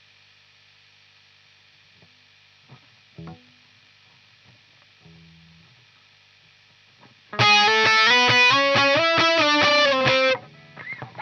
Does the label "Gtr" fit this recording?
Yes